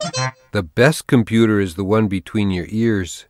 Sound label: Quest Completed; Garfield's Diner; Android game music